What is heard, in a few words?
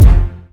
Kick